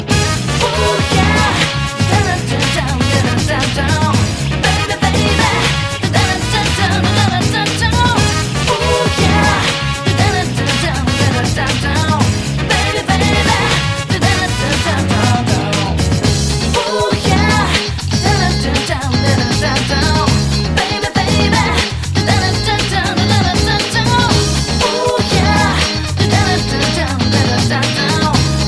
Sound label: Battlethme; Persona 3